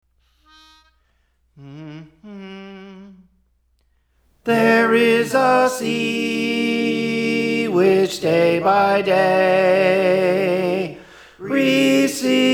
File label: Tenor; Praise For The Lord Training